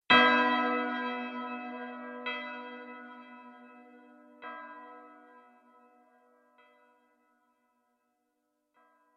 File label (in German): glocke ton massage